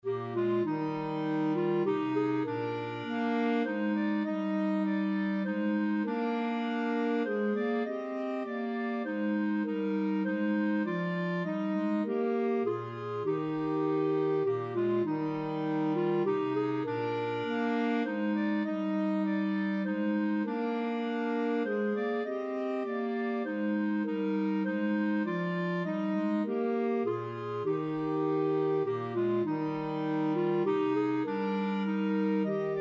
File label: first noel clarinet